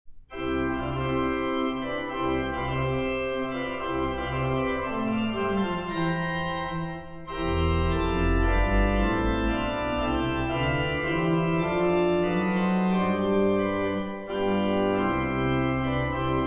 organ sonata no 2 in c minor Allegro maestoso e vivace; Mendelssohn